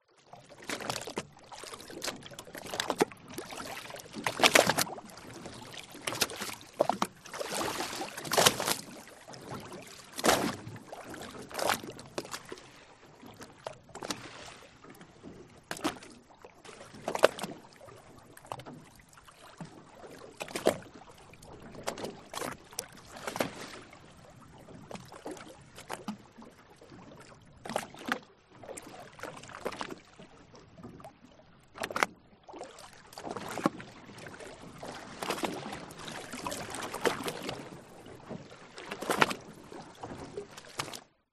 transport boat small fiberglass floating engine off small waves lap into engine